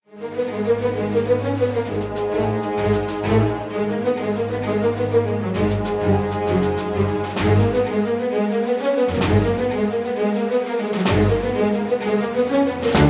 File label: Fight Loop; Zavix Tower